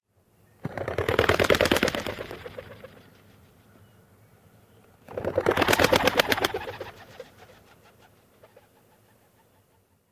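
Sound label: two birds taking flight, Rock Pigeon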